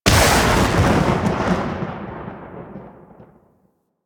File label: Lightning Impact; Enemies; Abilities; Warframe; Teralyst; Rainalyst